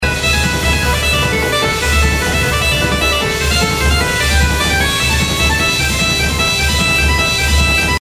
Anticipation loop; Highland Reels

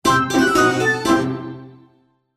special Symbol Win; Enchanted Prince 2; Eyecon

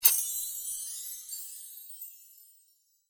bonus prize coin spin finish; Temple of Iris 2

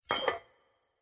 spin miss; Eternal Wishes LuckyTap

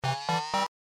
nudge short; Step Back 7's

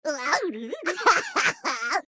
voice laugh; Fruity Burst 2

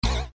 cascade Land; Bouncy Balls 2

